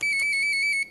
fim som da urna

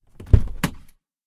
porta abrindo

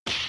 som de tapa

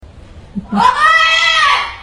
papagaio oh mãe, pets natureza

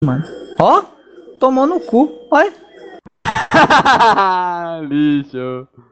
risada

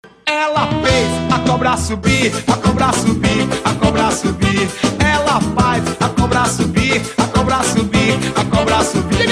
danca do ventre samba

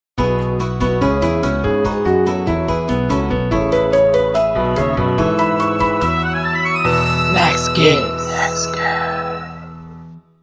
vinheta

